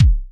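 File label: kick, Boss DR-202; Drums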